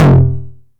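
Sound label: 909 high tom tape saturation; Goldbaby Tape808 & Tape909; The Tape 909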